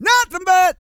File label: E-BIT 136, R&B Dance vocal